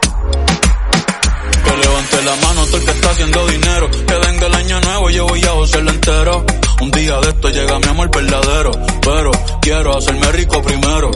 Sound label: 100bpm Db